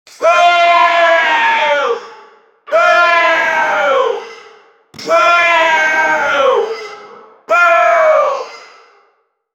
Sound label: goblins yelling among